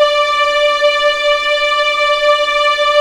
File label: D5 EXP MF, VIOLINS; East West; The Ultimate String Collection